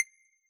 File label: seq click; AnlgKit1